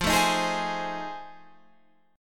F 7th Sharp 9th, Guitar, Open F; F7#9 strummed; 17,16,15,15,15,15

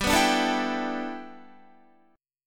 G 6th Add 9th G Guitar, chord; G6add9 strummed

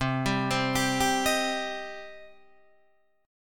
C Minor C down Guitar DADGAD

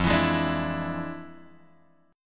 F Minor Major 13th, F; {1 X 2 1 3 0} strummed

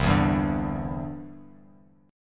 C-Major Add 9th, Bass Standard; strummed

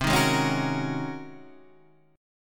G sharp 11th C

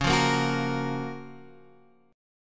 F sharp Major 7th, C sharp

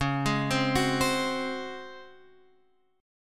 Db Major 7th Suspended 4th, Guitar Open F; DbM7sus4; arpeggiated; x,8,8,7,9,7

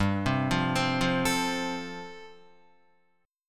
G 7th Suspended 2nd Suspended 4th, Guitar

